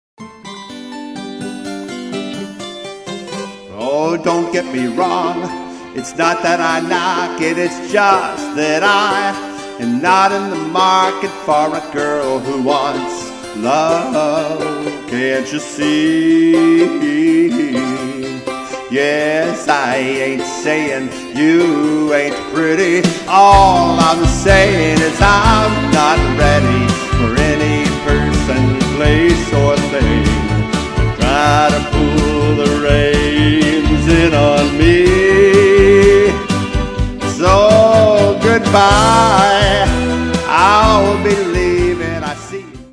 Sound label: Drum